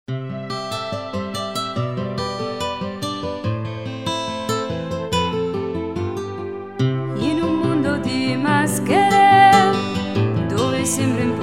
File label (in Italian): Canto dell'amicizia (Con arpeggio)